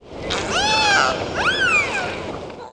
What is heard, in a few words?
dolphin Sound